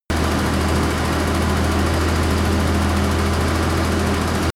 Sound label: tank small engine fast steady